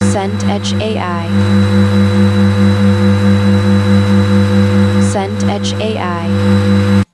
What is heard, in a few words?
bed washing machine